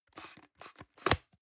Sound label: plastic can small lid screw open medium